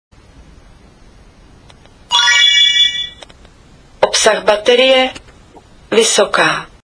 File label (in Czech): olympus ds75 zap batrie